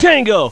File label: TANGO